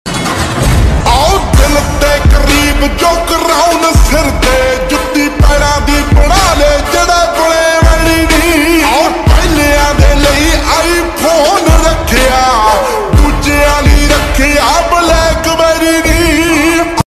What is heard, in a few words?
call tone